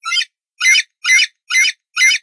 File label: eaglet bird; soundbible